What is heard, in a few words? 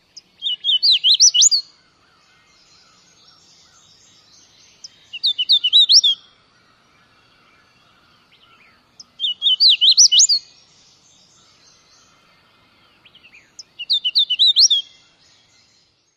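dawn song, hooded warbler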